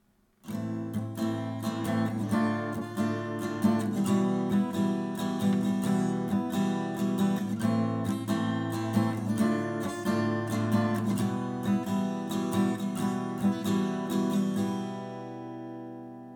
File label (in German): i iv v A Moll